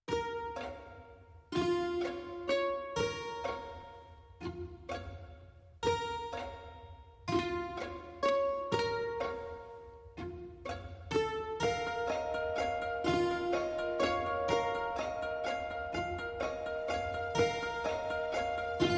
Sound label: Strings Guitars and Harp Only